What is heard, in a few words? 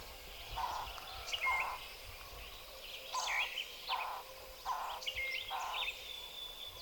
Cuban trogon